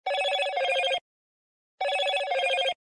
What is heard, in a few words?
motorola message sound